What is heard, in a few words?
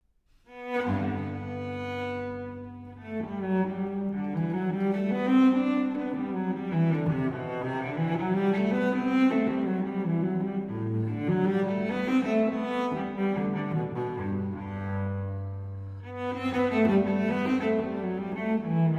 Cello Suite No. 1 in G Major, BWV 1007; J.S. Bach